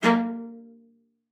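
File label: CELLO spiccato A#3; Xsample VOL-5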